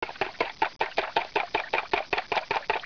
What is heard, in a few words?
beateggs; 12000 Graphics Pack - A Complete Graphics Collection For Your PC